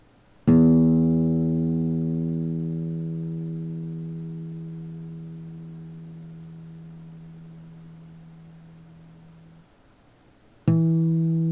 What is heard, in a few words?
Low e harmonics